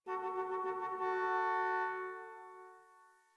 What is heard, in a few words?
Parking Lot Distant Car Horn